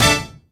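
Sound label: synth brass attack, dance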